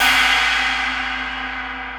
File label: 21 inch china crash; cymbal; vault v holy; Sabian